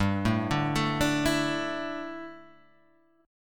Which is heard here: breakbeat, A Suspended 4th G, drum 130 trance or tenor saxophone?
A Suspended 4th G